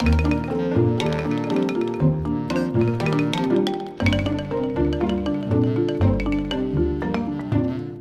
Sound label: classic xylo; rayman origins 2011 gamerip; music world